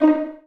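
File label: Vio pizzicato D#3; Digital Sound Factory Virtuoso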